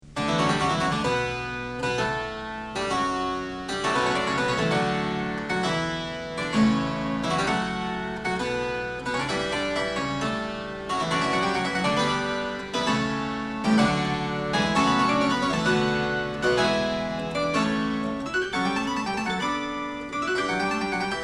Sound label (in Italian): fuga; bach; bwv850